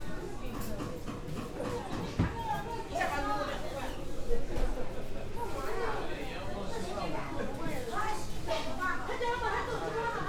SHANGHAI walla interior market vegetables meat fish lively close voices chinese plastic; plastic bags choppin; Sun-Smile-Island; Shanghai Ambiences